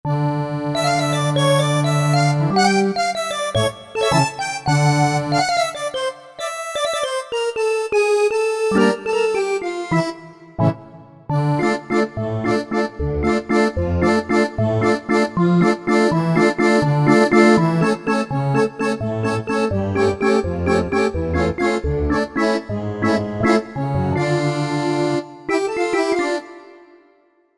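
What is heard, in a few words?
Organ + Accordion, Korg MSC-9S Organ; Org.+Accd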